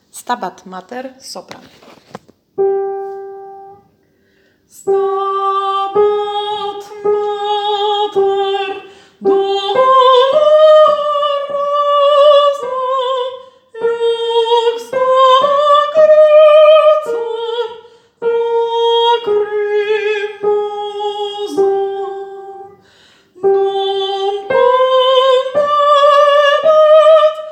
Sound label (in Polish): Sopran; Stabat Mater; piesni wielki post